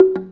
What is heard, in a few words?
GATO, Percussion; InVision Interactive